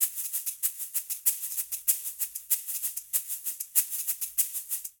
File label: Son Shaker 192bpm; Latin Percussion; Ableton; Factory Packs